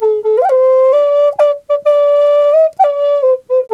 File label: didgeridoo riffs; Big Fish Audio - Didgeridoo and Other Primitive Instruments; FLU RIFFS 1